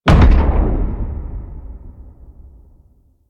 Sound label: Far Step Default; Warframe; Corpus; Arachnoid Hunger; Steps